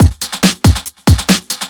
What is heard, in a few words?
Breakbeat 140 BPM; Vengeance Packs; vengeance essential club sounds-3; VEC3 Loops 140 BPM; VEC3 Breakbeats 140 BPM